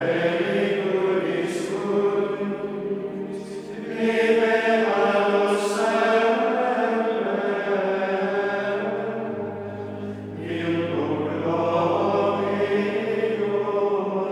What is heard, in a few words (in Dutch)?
Koor (mannen) Gregoriaans; Sub tuum praesidium